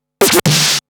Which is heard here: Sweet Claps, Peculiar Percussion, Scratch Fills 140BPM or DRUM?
Scratch Fills 140BPM